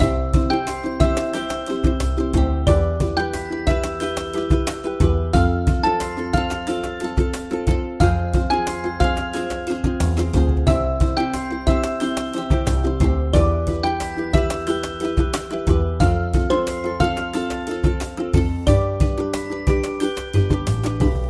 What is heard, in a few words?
Ukulele Song; Hold Music